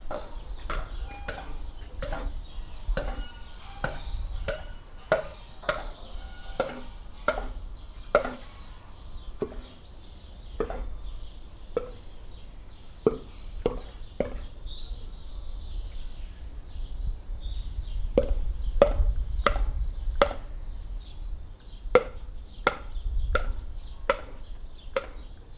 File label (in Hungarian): klaffogas fele, orvosmedve; debreceni zoo 2012; marantz puska